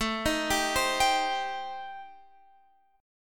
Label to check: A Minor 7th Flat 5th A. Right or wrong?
Right